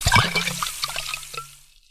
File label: Potion Underlay